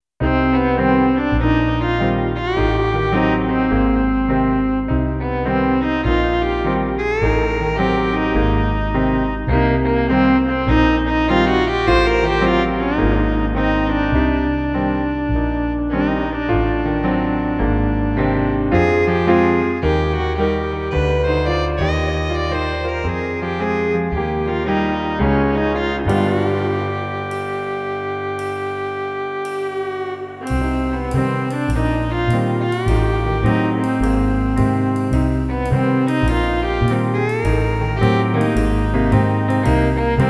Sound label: jazz violin; JAZZ EXPERIMENT - WITH VIOLIN LEAD